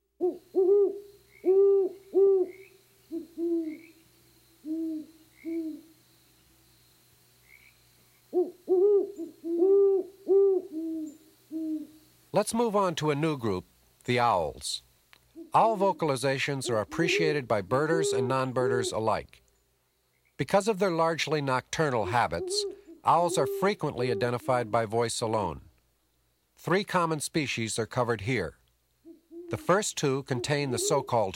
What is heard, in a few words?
Owls and a Dove- Great Horned Owl, Barred Owl, Eastern Screech-Owl, Mourning Dove; Birding By Ear